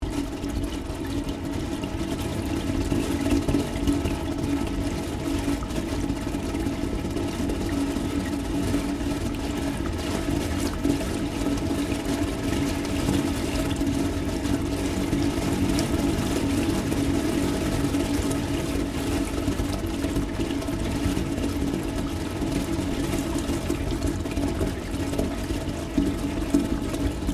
Water Gurgling In Pipe Sequence, Severe hail storm; Weather